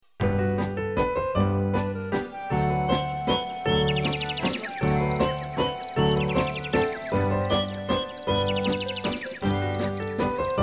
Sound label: Woodland Waltz